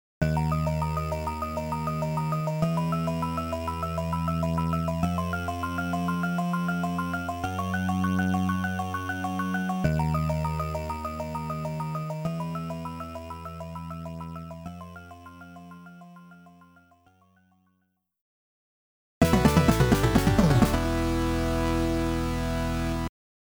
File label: Continue ~ Game Over; Legend of Game Music Consumer Box; Aldynes